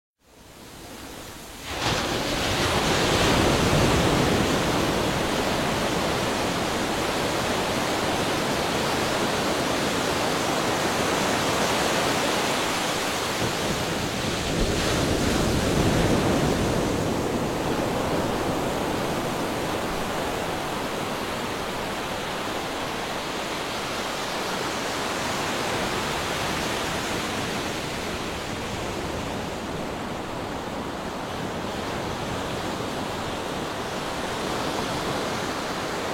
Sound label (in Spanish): Sonido de Olas del Mar